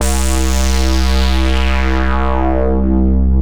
Hard Bass, C; Brave

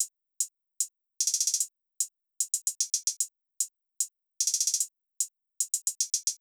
Hat Loop

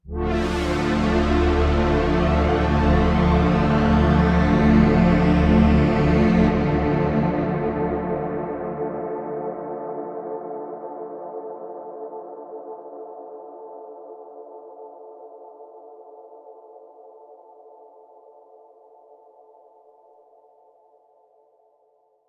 Pad EQed vintage Flanger cloud

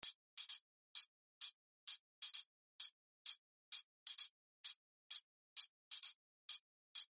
140 HIHAT

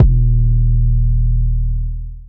808, D

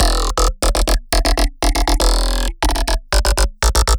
Bass Loop 2bar 120bpm E

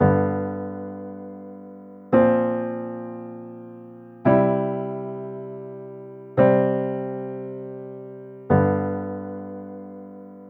Piano, 113, C, Dry

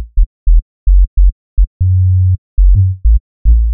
bass 128 Fm; holden

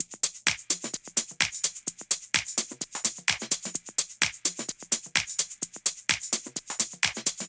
128 Drums No Kick

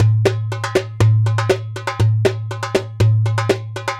Maqsum 120

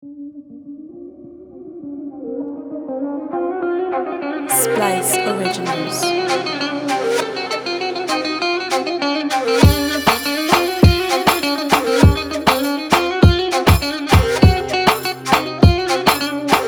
Turkish Roots; Splice Originals

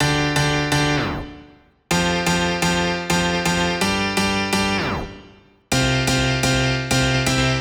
Poly Piano 126 Dm

